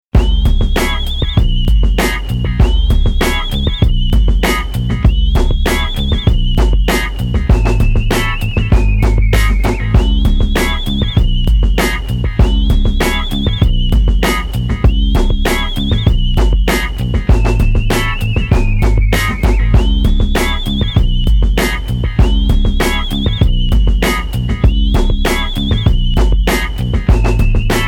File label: Rap Instrumental